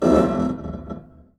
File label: String Rub Erratic, Piano; Piano Miscellaneous